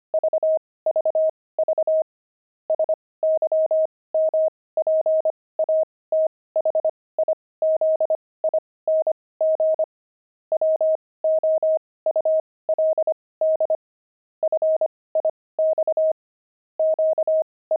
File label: pangram quaker